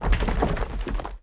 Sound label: reel Bounce background; parthenon